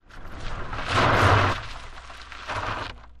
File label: Car Tires Gritty Ski, tires on dirt